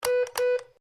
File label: MORSE CODE M; Morse code aplphabete; Morse Code (Sound Effects:Electronics)